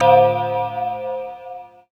Percussive Stab

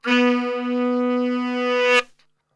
B 2 SAX, Brass Super Section; Best Service

